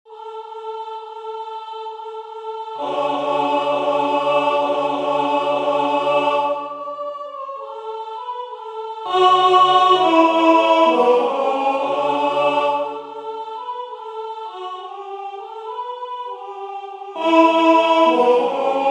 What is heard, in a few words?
TENOR; Xicochi xicochi; Toronto Choral Society